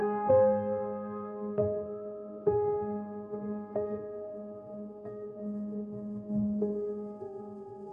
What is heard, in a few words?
sad piano; sound effects